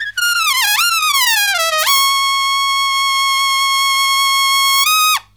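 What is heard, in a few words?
solo trumpet, Killer Brass Riffs; USB Soundscan vol.29 - Killer Brass Riffs; AKAI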